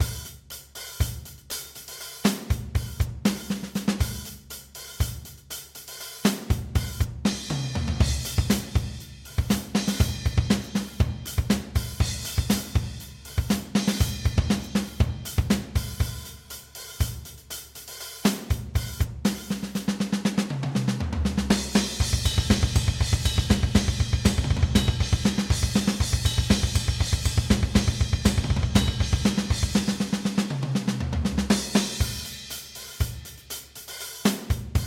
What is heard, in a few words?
Big Mono Analogue Drums Test; Hydrogen